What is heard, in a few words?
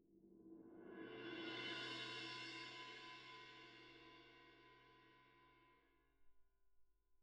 Perc